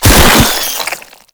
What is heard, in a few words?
Maggot Pain; Enemies; Vocals; Warframe